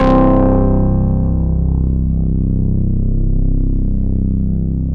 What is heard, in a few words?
SYN BASS